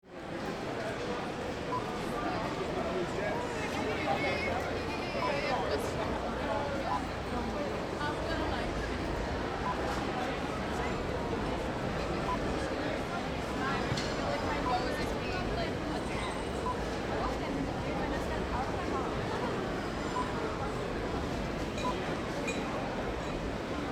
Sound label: London Market Borough